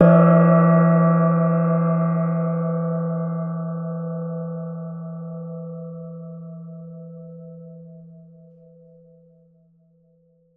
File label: Small Gong; Percussion Samples